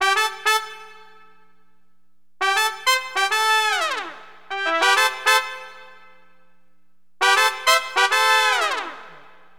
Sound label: FNK HORN, Wind; LiveAct Pool; AcidJazz Funk